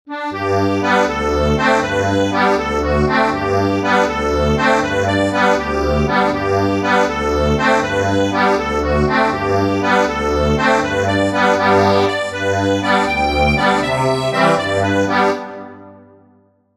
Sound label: accordion